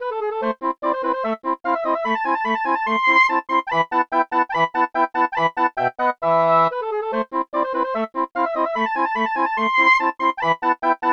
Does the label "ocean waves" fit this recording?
No